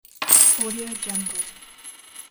silver coins drop

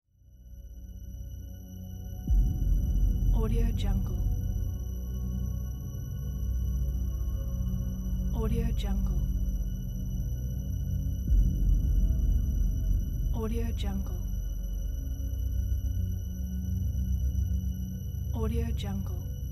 mystical cinematic atmosphere

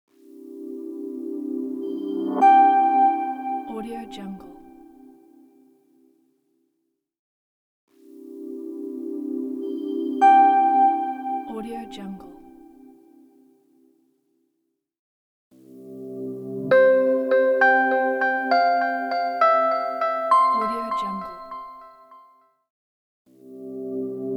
company logo pack

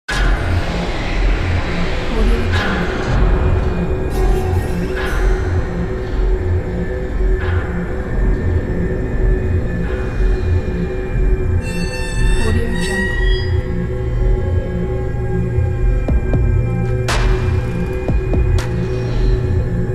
horror scary town

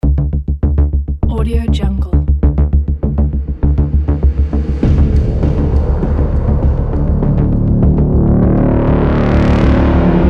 dark modern electronic trailer

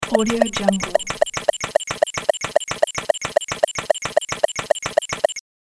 videogame moving parts

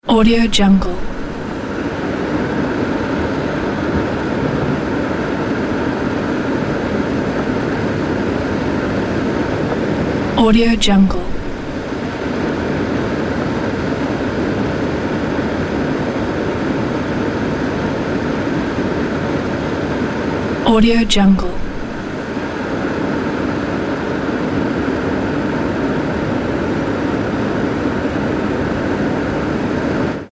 air conditioner

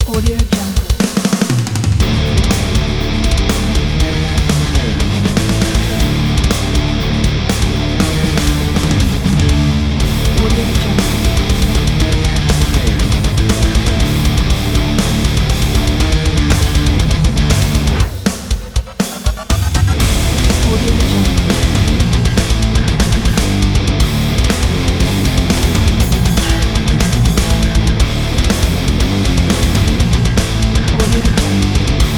metallic

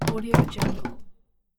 old schooner boat utility room door

